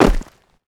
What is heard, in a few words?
land dirt, footsteps; player